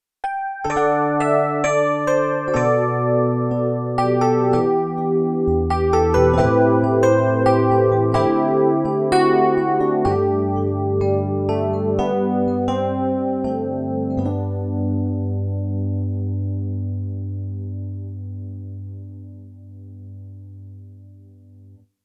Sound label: ballad ep; nord grand